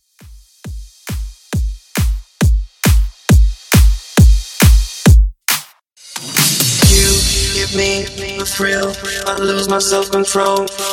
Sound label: Re Drum 136Bpm